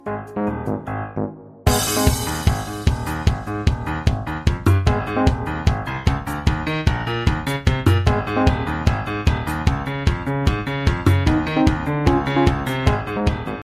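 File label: iphone 4s new ringtone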